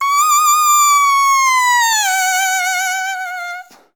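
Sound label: english horn; Best Service; Peter Siedlaczek's Advanced Orchestra; EH FX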